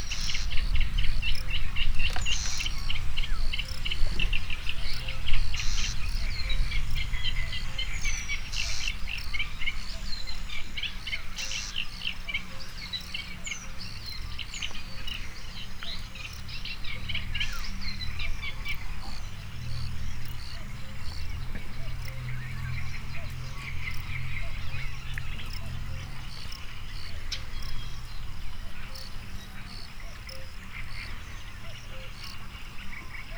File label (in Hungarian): madarak bölömbika, halastavak; hortobagyi nemzeti park 2013